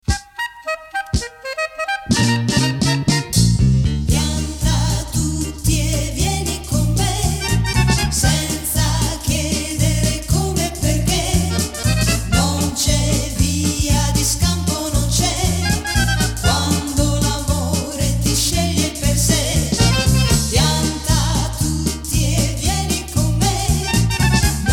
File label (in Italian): pianta tutti